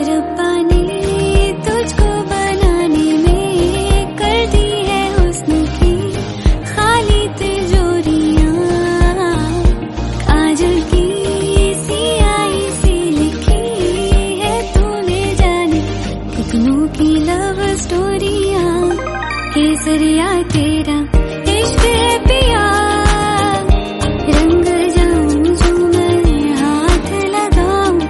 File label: female cover lofi hindi